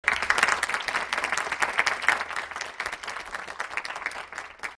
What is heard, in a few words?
Applause